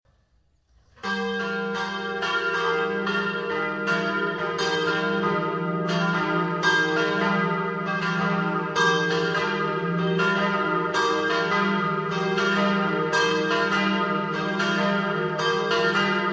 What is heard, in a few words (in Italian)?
campane; CHIALMINIS